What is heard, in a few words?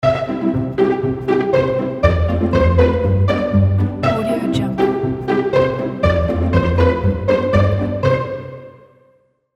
comic pizzicato ident